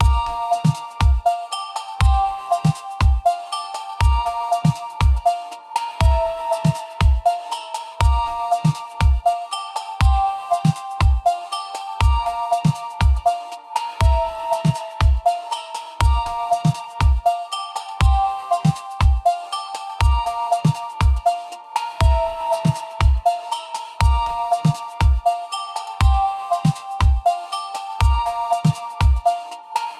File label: ringtone 120 bpm; theme